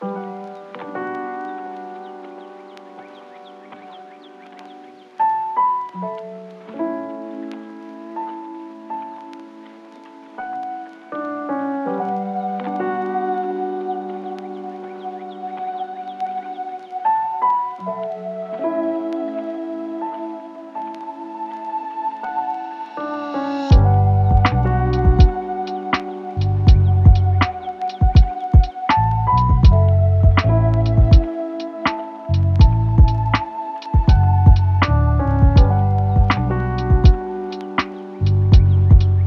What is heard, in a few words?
Nature Lo-Fi